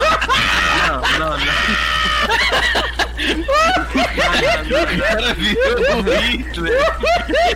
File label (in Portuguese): risada